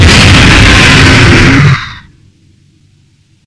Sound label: jumpscare sound; the sea shift at austins